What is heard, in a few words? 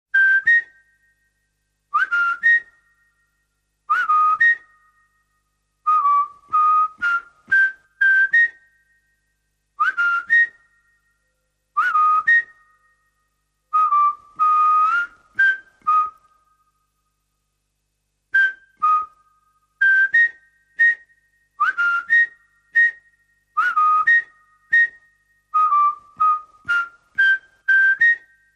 Whistle Only